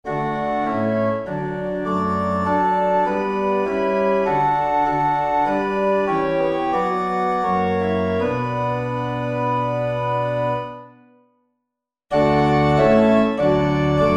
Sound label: Organ